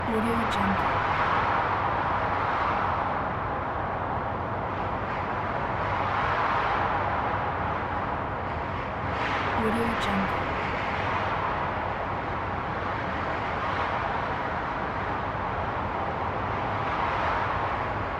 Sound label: sci fi radioactive wind loop; futuristic